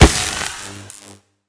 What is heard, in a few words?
hit; smite; god raijin